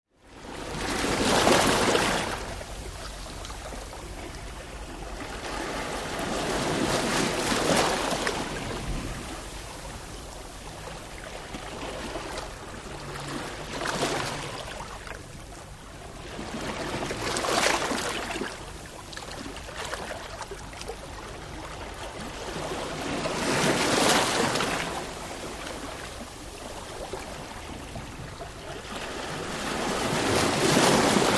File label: Sea Waves, Waves; Light ( Onto Sandy Beach ); Small Lazy Waves